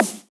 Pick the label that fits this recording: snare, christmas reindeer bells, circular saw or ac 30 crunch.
snare